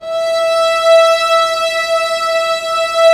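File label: violas, sordino; Vlas Bow FX; Vas Sordino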